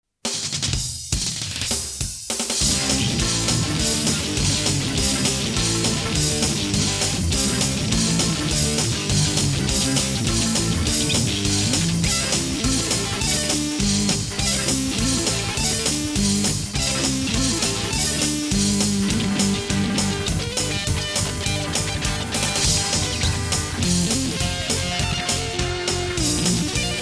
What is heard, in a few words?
Sweet Picking